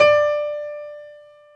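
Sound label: grand piano; B-GRAND PF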